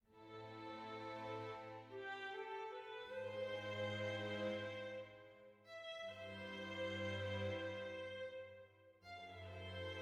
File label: sad soft; Quivr; PS4 Windows gamerip 2016